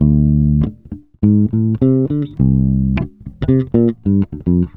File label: SOUL 100 D, Bass Grooves; USB Soundscan vol.30; AKAI